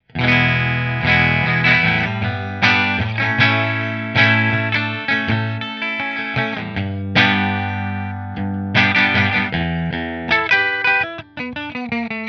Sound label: Italia Position 3 Fender